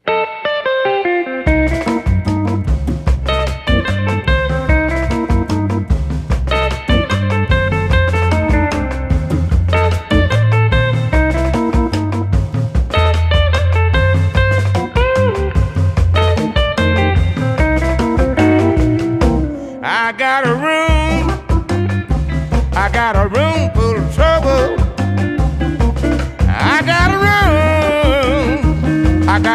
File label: Blues